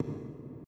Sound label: footsteps generic